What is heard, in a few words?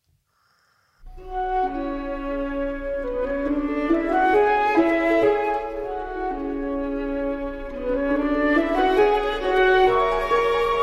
Sonatine En Trio For Flute, Viola And Harp; Ravel, Maurice